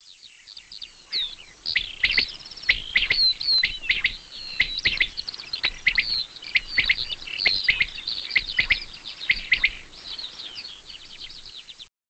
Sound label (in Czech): zvuk, ptaci